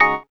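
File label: organ chord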